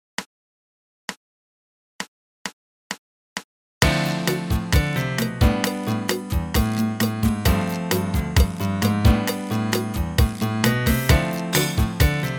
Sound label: Piano and Percussion